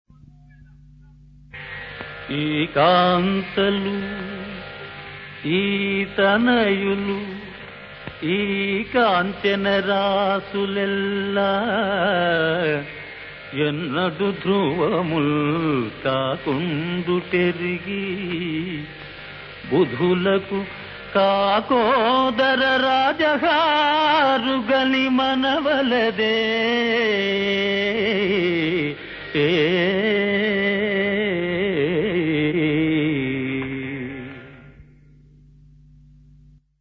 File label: Padyam; TenaliRamakrishna